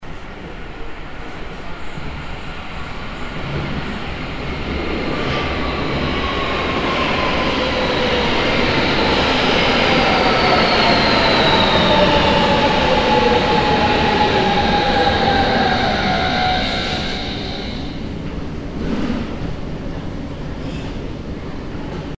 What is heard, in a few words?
subway arrival